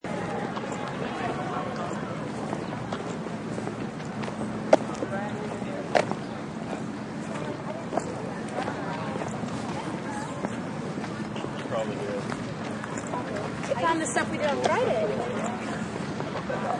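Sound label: College Campus Outside, University outside ambience.; People; Human; SFXsource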